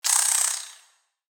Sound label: Spider Idle; Lego; creatures mechanical